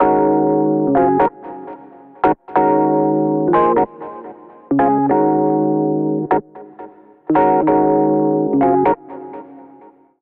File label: Little Amp Electric Piano Eb Minor 94 bpm; MIDI Clips; Accompaniment; Ableton; Chop and Swing